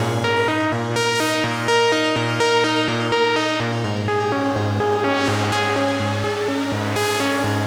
125 A Synth Loop Spiral

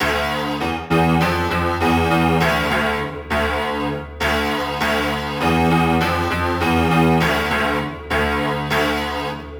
100bpm Depth Piano Melodic Loops

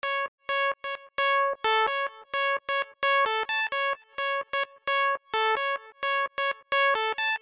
122 F Synth Bass

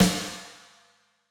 Snare 127

